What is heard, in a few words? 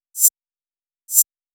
Reverse Cymbal, 128 BPM; Vengeance Samples; VTDS2 Song Kits; Rap To The Top